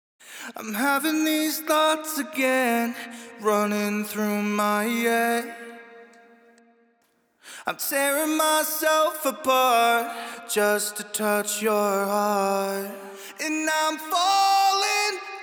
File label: Heavy Main Vocal Wet, Acapellas, Fmin 135bpm; Stems; Heavy Vocals 2